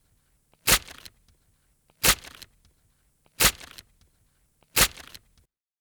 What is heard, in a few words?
Paper Tear